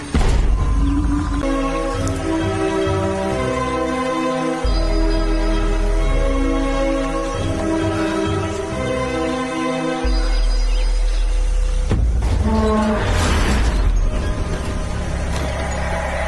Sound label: Malayalam Interval BGM; Forensic